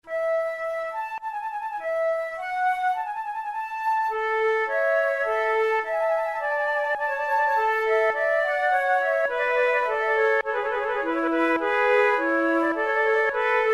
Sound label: two flutes prelude; Monteclair; 6 concerts; flute tunes